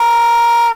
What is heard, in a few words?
Horns